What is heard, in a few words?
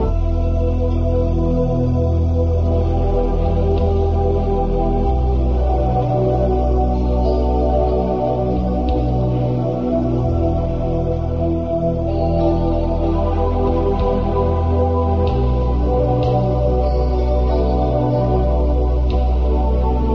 sweeping pads; Loops; Electronic